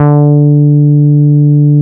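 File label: NORD MF D4, bass; NORDY BS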